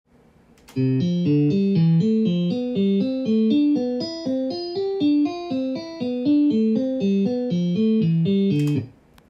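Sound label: c major sequence, guitar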